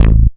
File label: Synth Bass (A); Basses